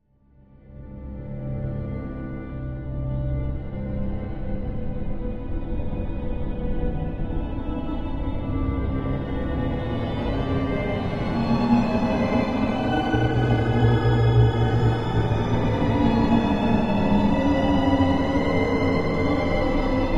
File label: Music Eerie Slow, orchestral